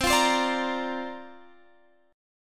C 7th Suspended 2nd Suspended 4th, C, down, Guitar, Drop D